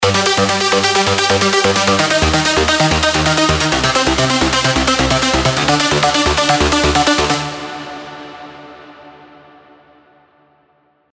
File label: EDM Arpeggiator; Nexus 3; Saga